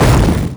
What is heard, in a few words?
death totem; spawn; earthshaker; the monster plane; kolmods